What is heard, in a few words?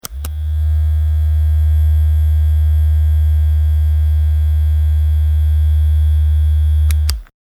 Massager machine being turned on and off with buzzing vibration.; Machinery; Machines